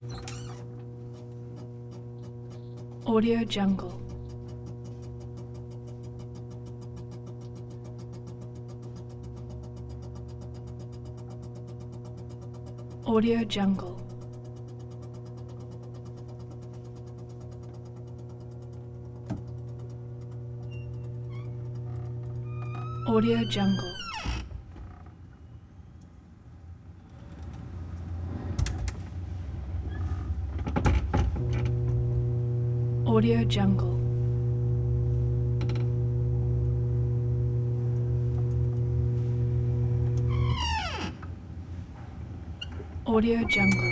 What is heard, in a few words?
wood phone booth door open and close creaks